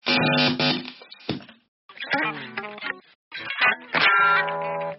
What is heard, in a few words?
Guitar Start